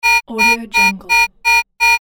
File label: panic alarm loop